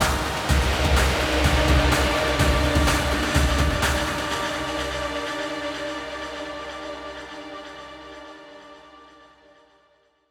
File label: Jingle Mission Success; Daemon X Machina Prologue